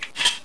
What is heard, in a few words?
ak74 mag in; firearms